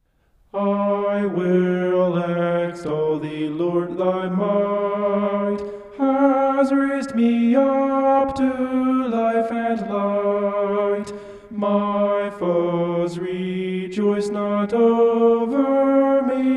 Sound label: vocal homoph; psalm 30